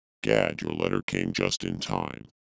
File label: Contrabass